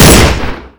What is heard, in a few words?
pistol fire, 1911; HL2-Nerv